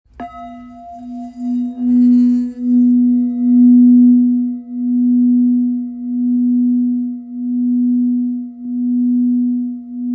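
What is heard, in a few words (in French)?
Bol grave bouddha si 570g, Bol chantant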